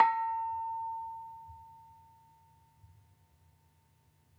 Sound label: Bonang